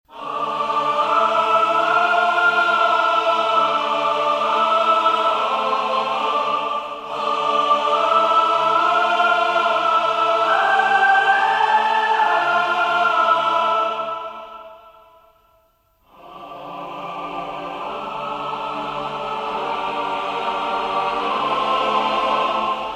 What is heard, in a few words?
Choir; Abbey Road